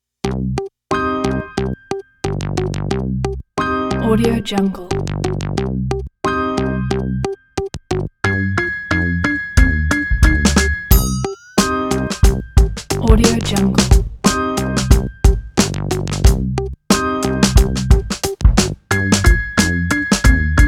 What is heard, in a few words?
hip hop